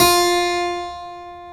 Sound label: KEY HRPCR; Roland - LA Composer vol. 1